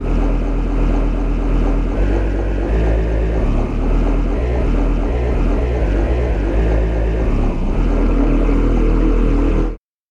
echo drops bass

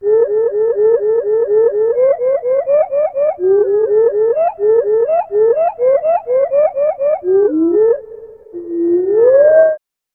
bird tweet bass

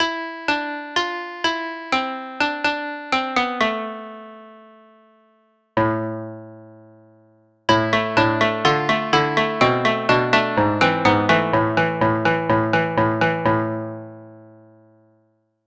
koto

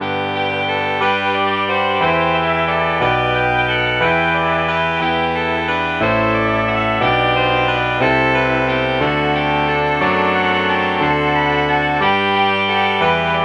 soprano sax

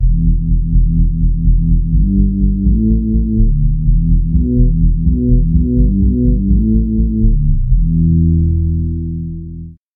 steel drums bass

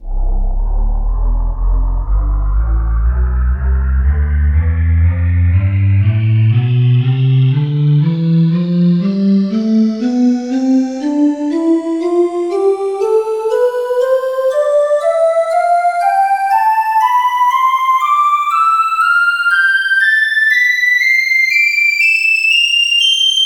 solo vox tonleiter

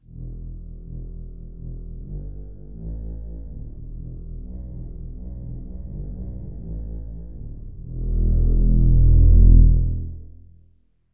warm pad bass

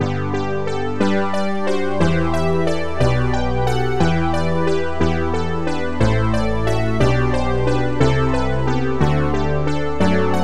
synth brass

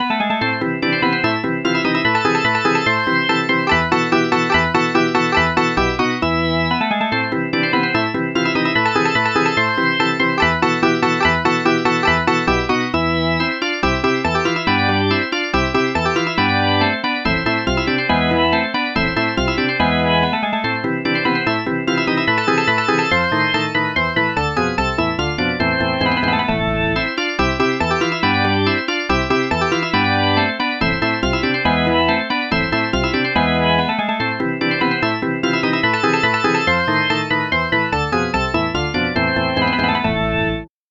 rock organ

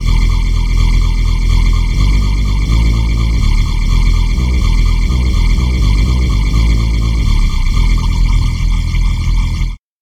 soundtrack bass

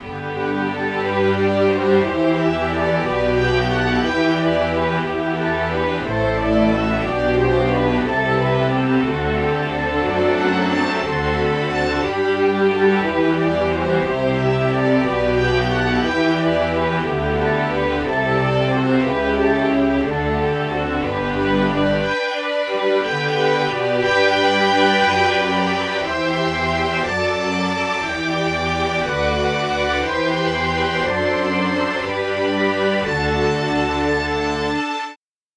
strings

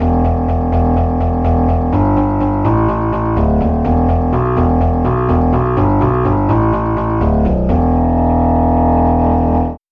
tenor sax bass

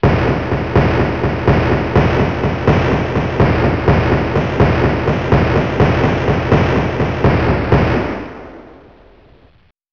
gun shot bass

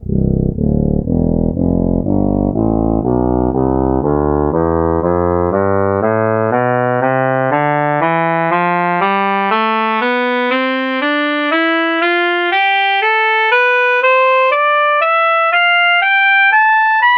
soprano sax tonleiter

